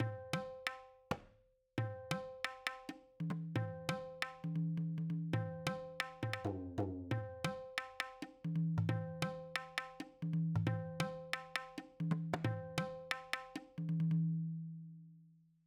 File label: Tabla Part B